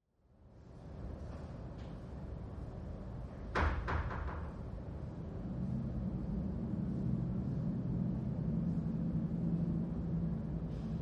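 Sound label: ambience, London Hospital Hallway; noisecreations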